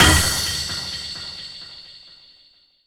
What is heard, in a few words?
DANCE FX